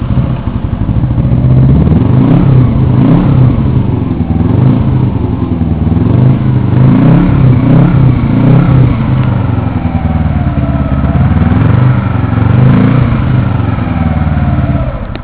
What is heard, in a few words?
Chunlan Tiger 125, motorek